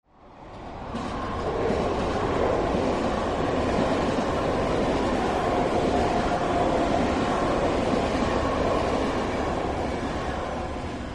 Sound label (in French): passage train sans silenzo